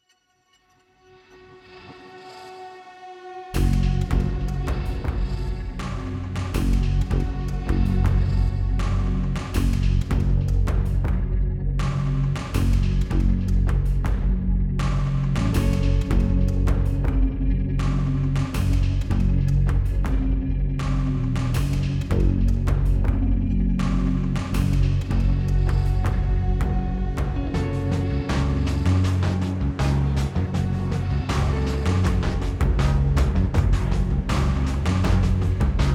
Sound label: Strings of Eternal Groove